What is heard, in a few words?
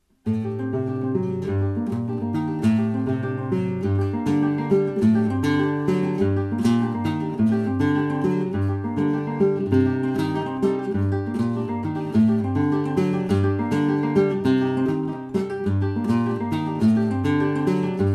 rumba, flamenco